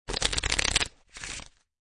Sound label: Cards Playing Cards Shuffling